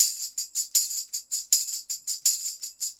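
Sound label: tambourines 80 bpm, latin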